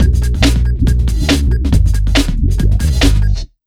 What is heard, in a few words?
Big Beat Drumloops